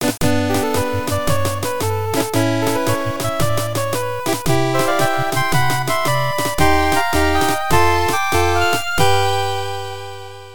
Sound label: victory